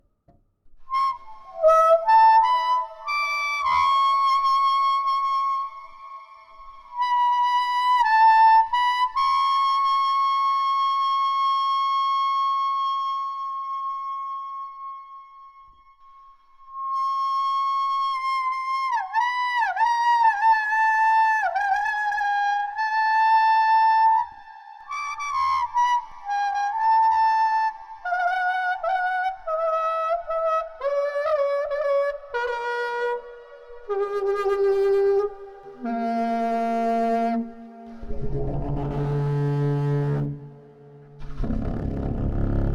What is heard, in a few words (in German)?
Rohr Reed Wackelig